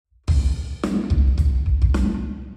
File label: vertical Drums 0deg